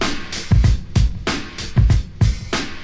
phat break, drum loops and breaks, 095 bpm; 091 to 100 bpm

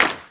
muted clap; Claps; single hits

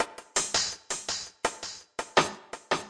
echo hard hats, drum loops and breaks, 083 bpm

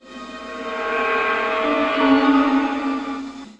eerieness, pads

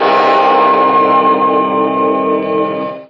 oldtime suspense, orchestral and classical

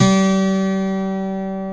12 STRING D, twelve string guitar

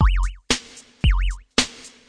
springy 909, 115 bpm

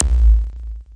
sub crackle

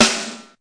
gated sd, snare; single hits

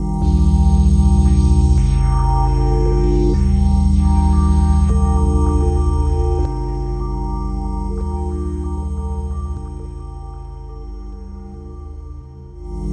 organ atmosphere; LOOPING AMBIENCE

